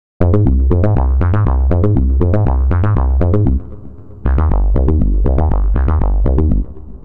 step seq 3 steps dry deep bass; the meditation soundset

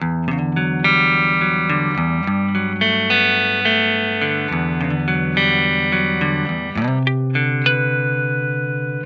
guitar riff dry, no airspace; airspace hybrid reverb and delay; modeaudio

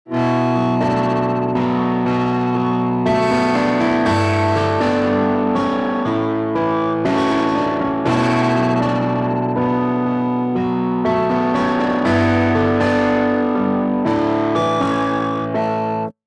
piano moving on wet; chiral audio

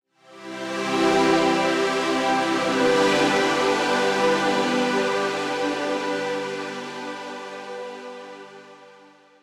pad latin; latin law; sm-271 calculator synth

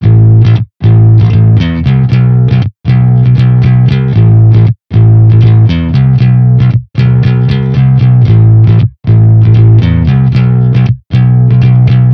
bass tube warmth

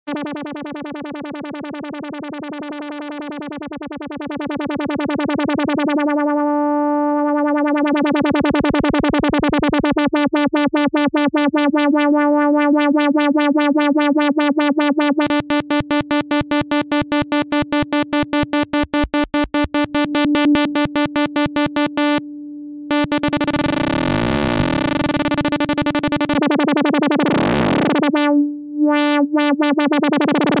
1 Osc 1 Filter LFO Test; Poly-Ana

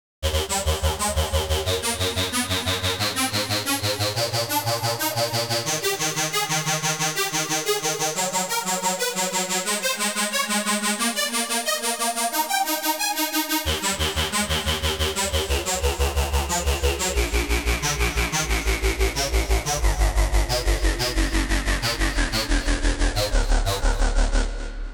californium arp; the space soundset

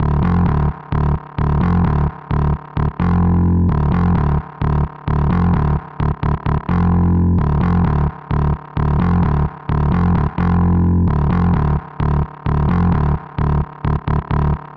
130 d bass loop; methane and octane; hollywood audio design

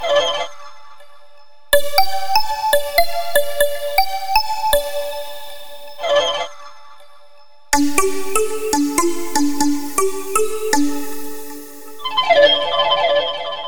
tangle synth; razors edge

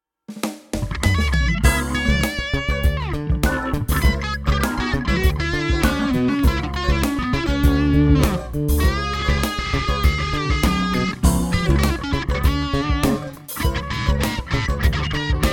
funk

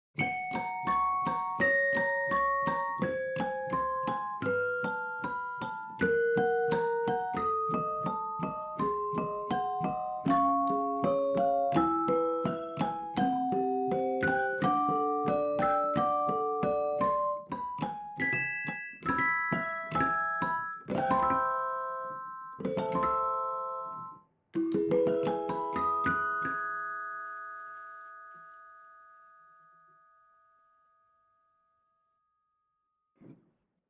real dulcitone; frozenplain

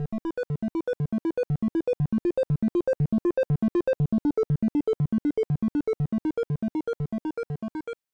arp dry; modular effect board; sonic instruments

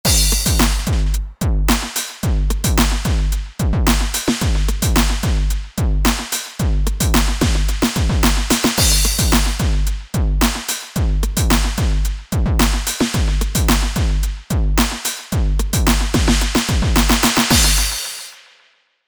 d909 multi out; superwave

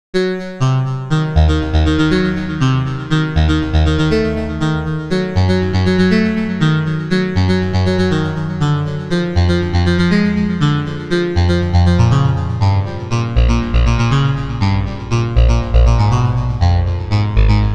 bronze arp; the space soundset

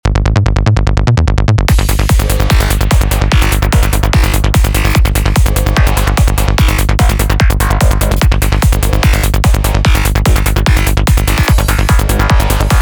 edm psytrance; psytrance essentials; golden screw studio

